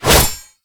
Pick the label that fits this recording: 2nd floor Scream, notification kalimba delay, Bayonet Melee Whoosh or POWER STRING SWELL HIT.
Bayonet Melee Whoosh